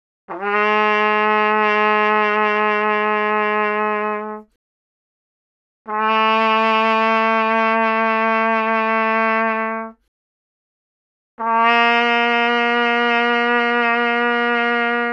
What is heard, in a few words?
Trumpet; 気鳴楽器; LipReed; Trumpet+Cornet